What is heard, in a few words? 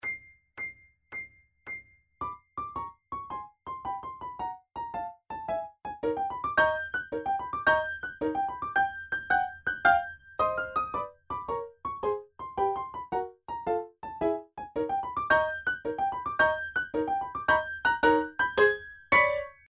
Tarantella Primo T110